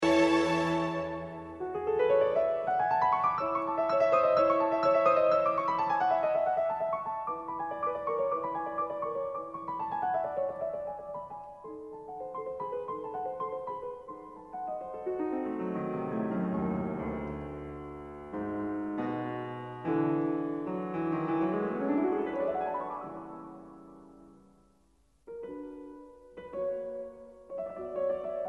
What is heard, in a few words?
piano concerto a major; mozart; k488